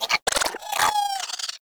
Squid Plant Attack Vocal; Objects; Traps

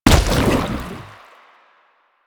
Step Default, Footsteps

